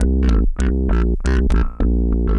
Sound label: Roland F Line, Roland S100